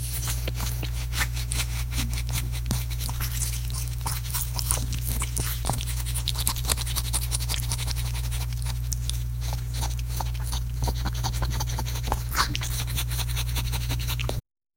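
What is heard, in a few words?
little dog licking himself; animal behavior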